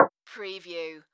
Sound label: Multimedia Percussion